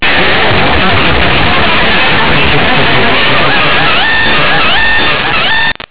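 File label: guitar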